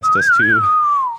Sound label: whistle